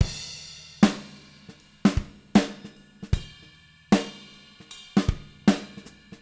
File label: 154 CRSH, NTPASS, Living Drums; Sampleheads; Peter Erskine